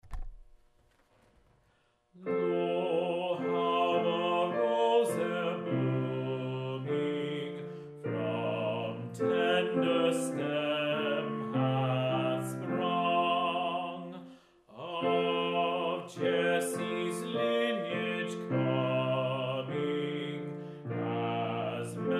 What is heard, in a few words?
Bass; Lo, How a Rose E’er Blooming, M. Praetorius; Advent